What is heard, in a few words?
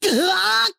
Damage